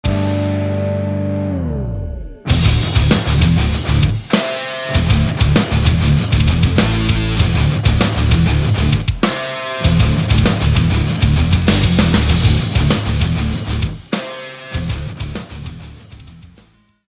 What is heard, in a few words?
Aggressive